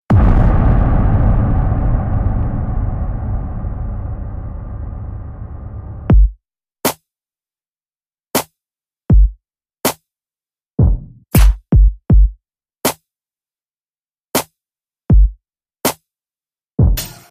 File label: Hood Beat